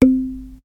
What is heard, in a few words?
Logdrum C